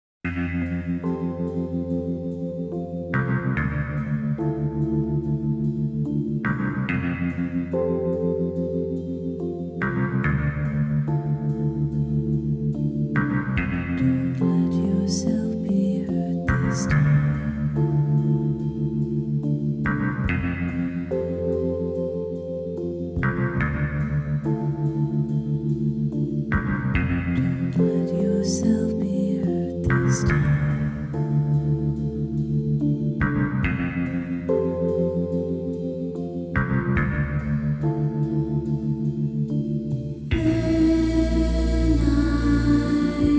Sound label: falling vocal